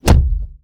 punch window

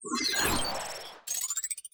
ui level complete

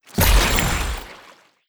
skill pool boost

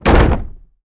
heavy object put down

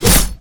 sword

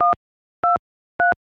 phone button

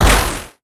rush 8bit

